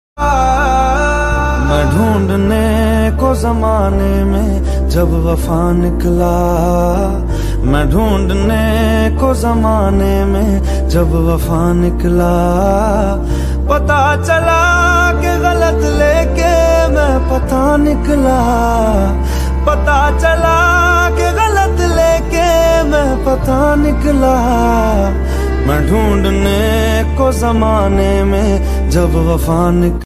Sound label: new sad ringtone